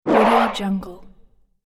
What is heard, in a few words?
throw item infographic swoosh element; cartoon